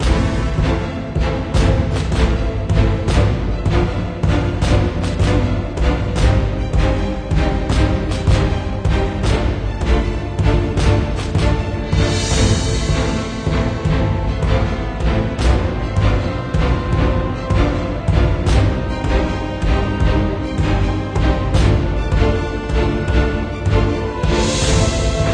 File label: battle boss